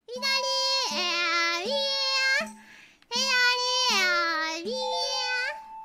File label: ocarina